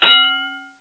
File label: gong ton